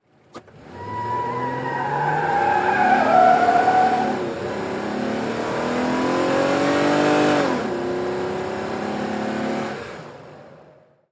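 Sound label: SUV Truck Accel Peel Out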